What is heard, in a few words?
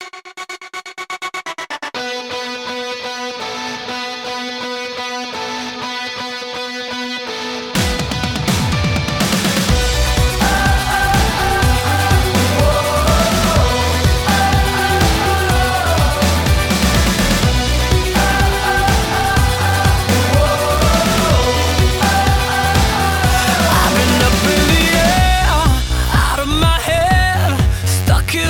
Male Vocalists; Z-I-v Summer Contest 2013